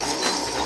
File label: win loop; eggomatic